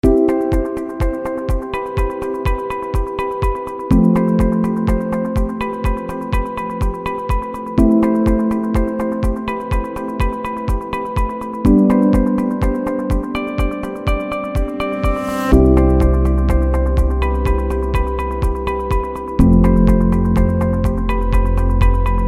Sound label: Soft Background; corporate music vol 3